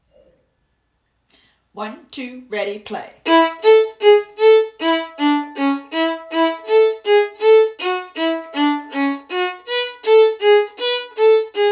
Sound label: Jingle bells harmony